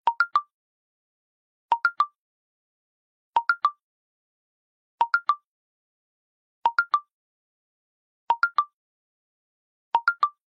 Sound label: Bongo Huawei